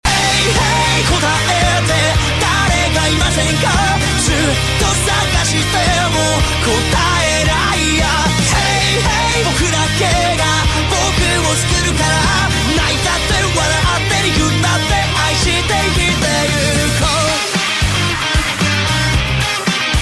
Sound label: Song